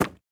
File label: stag footstep wood hard; Rust